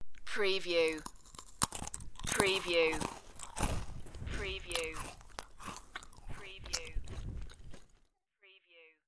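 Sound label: Chewing Cracker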